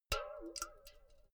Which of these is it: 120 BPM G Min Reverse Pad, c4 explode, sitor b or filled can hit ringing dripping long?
filled can hit ringing dripping long